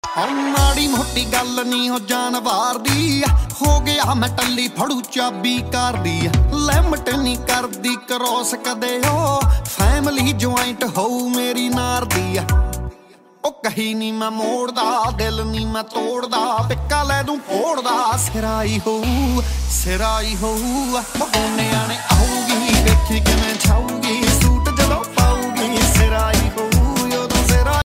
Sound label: Punjabi Beats Ringtone